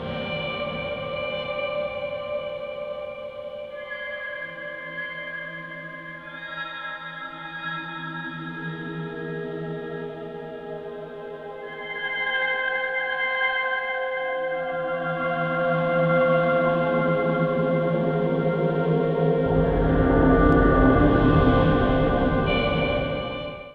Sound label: ambient pad; AMG; Global Trance Mission VOL-2